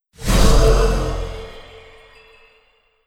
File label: Berserk Skill Spell